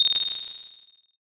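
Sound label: bell; suzu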